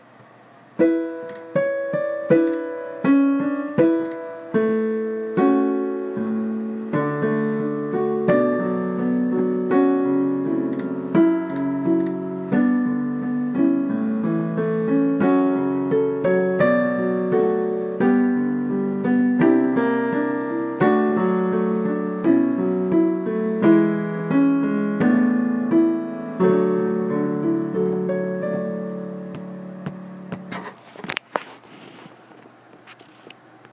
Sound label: solo just piano